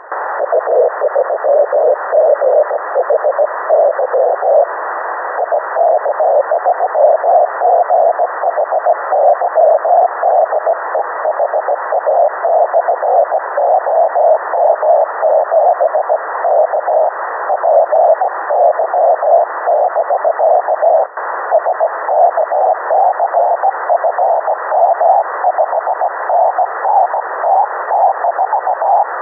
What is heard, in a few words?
CW; HA OM7PY